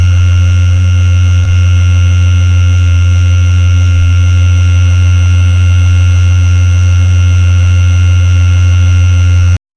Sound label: the humming sound of an, motors